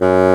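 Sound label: bassoon F#2; East West - Global Sounds; WOODS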